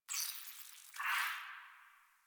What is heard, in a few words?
Tree Critters, Ambience; Props; Warframe; Duviri